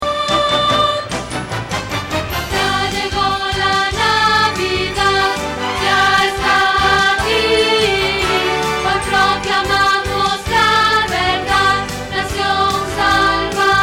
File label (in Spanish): Contralto